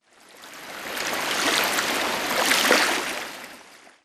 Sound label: swampland water lapping; Titanfall 2